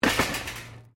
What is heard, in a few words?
Trolley Shopping Supermarket Bump, Shopping carts; Stores And Mall; Stores and Malls